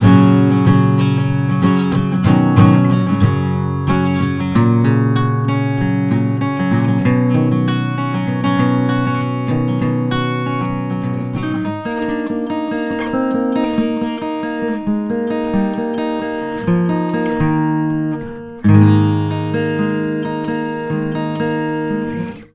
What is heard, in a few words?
ac guitar; Violão